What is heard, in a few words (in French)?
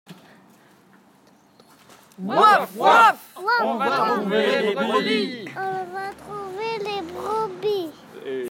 Cri de guerre